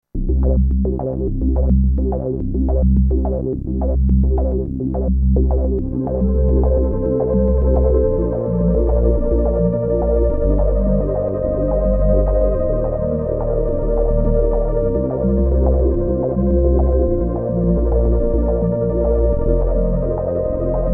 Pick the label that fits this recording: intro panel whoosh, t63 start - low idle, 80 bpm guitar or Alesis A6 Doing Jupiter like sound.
Alesis A6 Doing Jupiter like sound